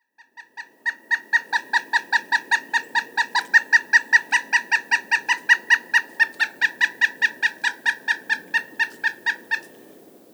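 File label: sounds of pileated woodpecker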